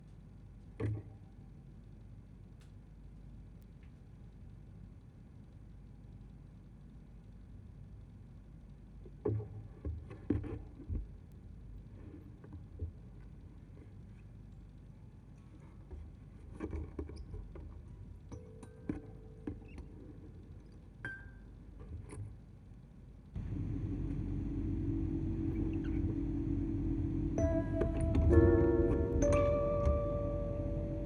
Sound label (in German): Aufnahme Tape Grundig 19 cm (Lautsprecher Tonband), 2 Spieluhren; Abspielen der Aufnahme vom 18.7. 'sand' auf 2 Spieluhren mit Tape; rauschpartikel